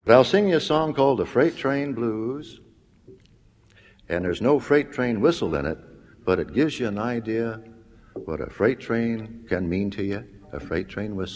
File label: banjo